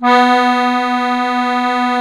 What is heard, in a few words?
HOHNER, akkordeon